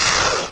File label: gas; Odyssey Demo 1.1